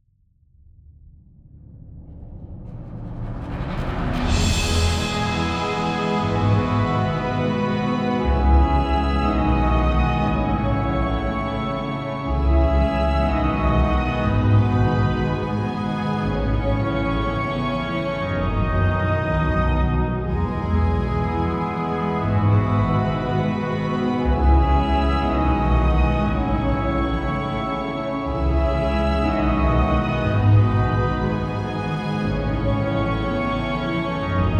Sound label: Wet; +3dB; illusion